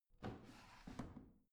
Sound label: washing machine drawer out soft